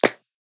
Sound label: Clap, One Shots Drums; Ableton Live 12 Lite; Core Library